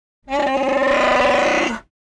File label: Yell; Chewbacca; at Finn